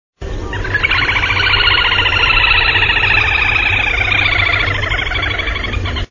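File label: little grebe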